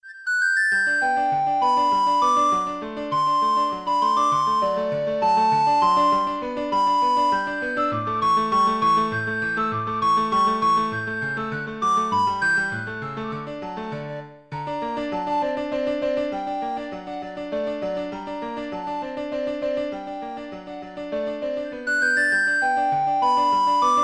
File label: boccherini minuet, recorder